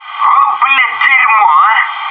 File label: "whoa"